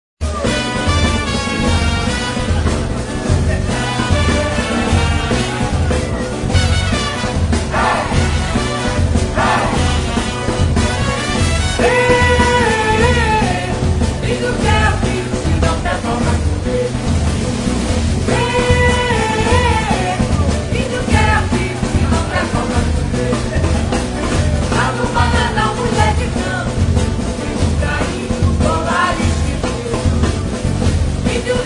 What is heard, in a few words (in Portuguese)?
Marchinhas de Carnaval